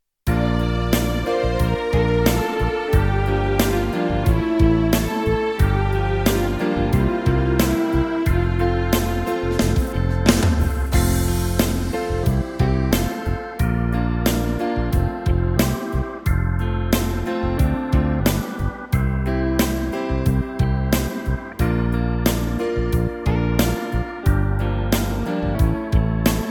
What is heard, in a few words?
BACKING; Easy Bassoon Christmas Carols Book One